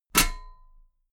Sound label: metal ladder step heavy